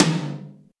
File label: TOM, Drums; Best Service; Real Mega Drums VOL-1